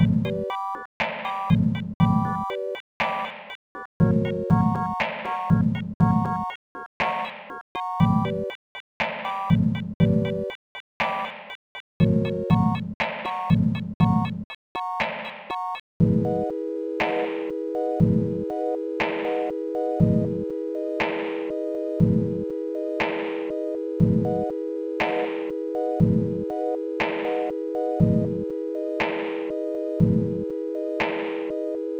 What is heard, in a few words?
Sinewave